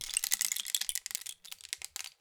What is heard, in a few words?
Rain Stick, Percussion Samples